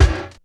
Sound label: E BD; ELECTRIC-SET